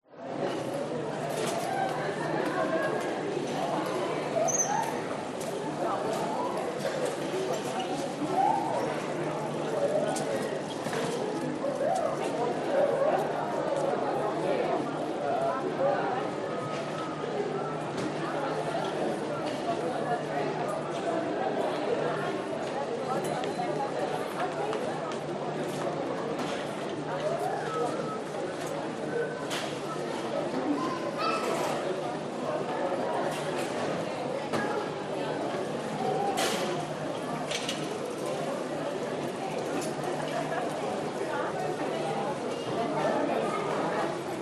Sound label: South Africa Airport; Audio Hero